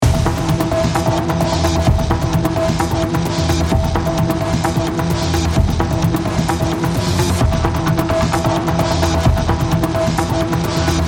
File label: Hacking AMB